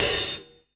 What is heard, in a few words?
Hat, breakcore Drum Hits